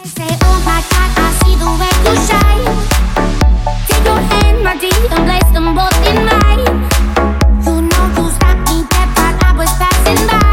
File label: remix